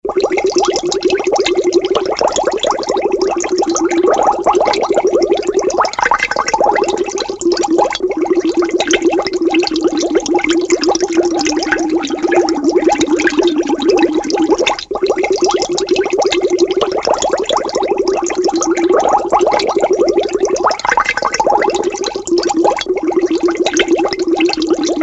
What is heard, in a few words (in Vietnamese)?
tieng nuoc soi sung suc, water bubbles sound